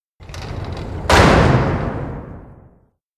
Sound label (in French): porte claquée